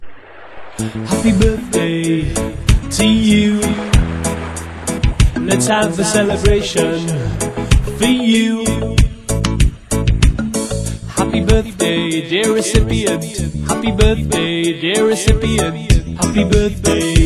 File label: reggae